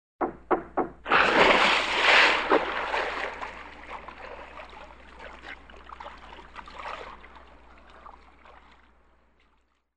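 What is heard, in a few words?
single dive into the deep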